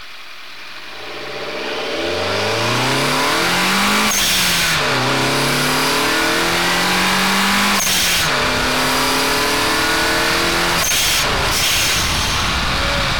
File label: Skyline Drift